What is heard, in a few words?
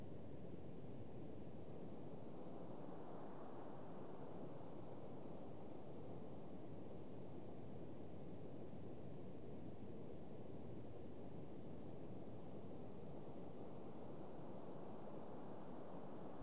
wind; twinStick